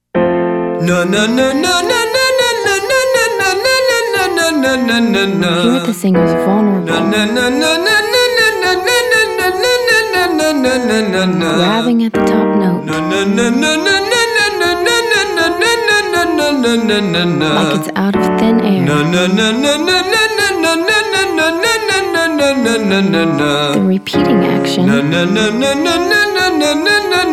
Exercise 5 stutter step male; singing lesson

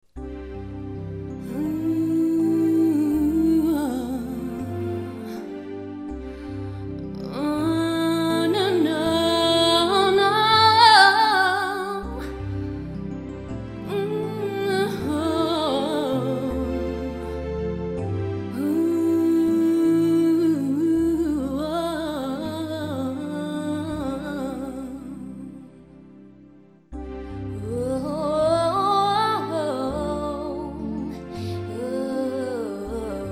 Style freedom examples Pop; styles

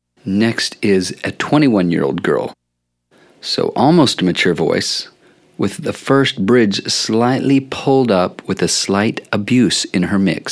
Mix Examples female; Exercise 7

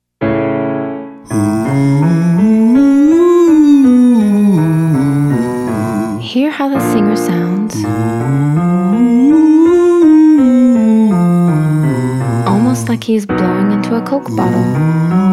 hooty Ooo male

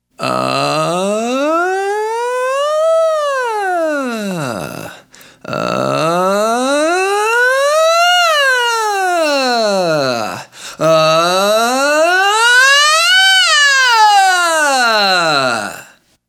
Uhhh male

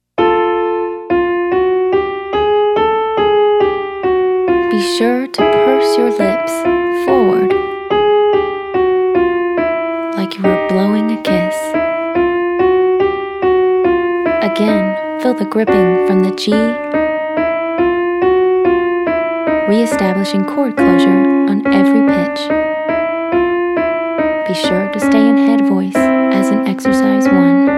Exercise Your turn Male

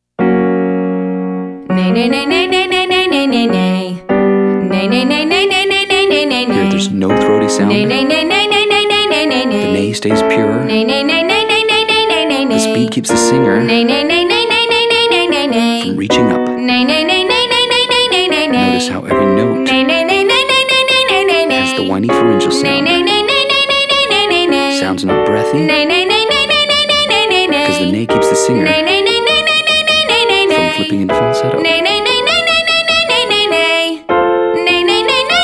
Demo Female; Singing

